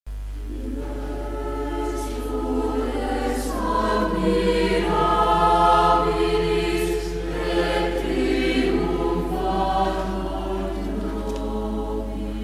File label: corale; treviglio